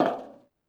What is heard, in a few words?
Char Fs Walk Metal; We Happy Few